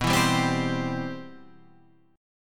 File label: C Major B